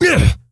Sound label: Stonebark Wound; Elder Stonebark